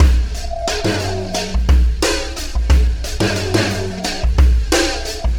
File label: Alien Influence Beat, Processed Drumkits